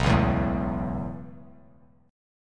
B 7th Suspended 2nd Suspended 4th down Bass Standard, chord; B7sus2sus4; strummed; JGuitar